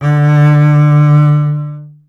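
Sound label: string contrabass D; Miroslav Vitous; Solo Instruments VOL-2; Solo 2 Bank 1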